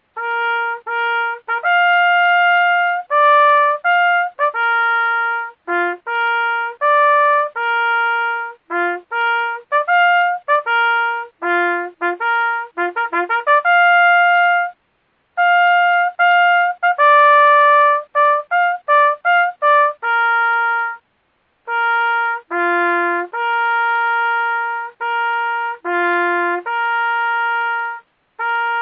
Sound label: Bugle Call SLOW retreat bugle call